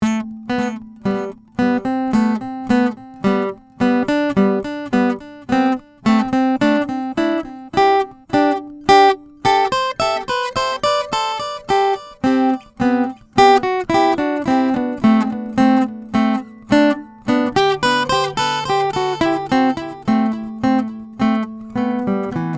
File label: Zoom A3 delay